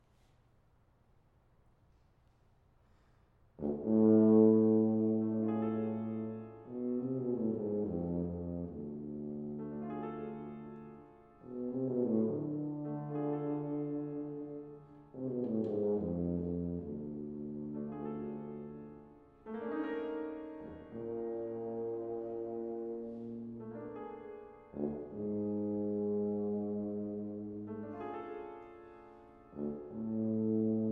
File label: Sonata for tuba and piano